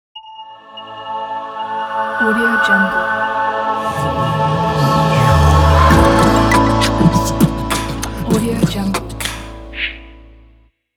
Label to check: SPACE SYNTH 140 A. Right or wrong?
Wrong